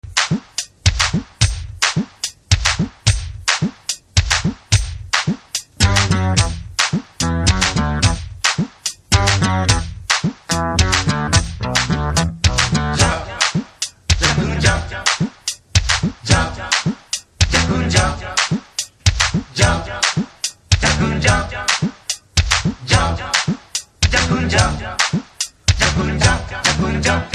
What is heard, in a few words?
Drum Beats Ringtone